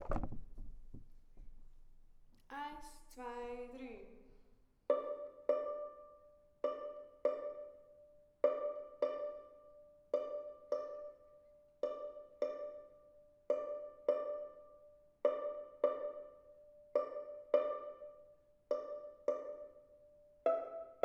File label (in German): Violine; Kinderorchester; Der Elefant; Violine 1; Kinderorchester 2